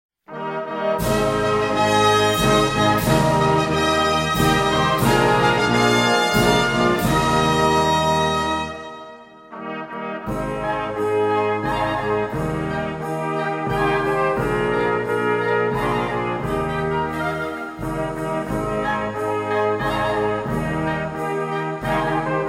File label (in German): Jauntalhamat fuer Blasmusik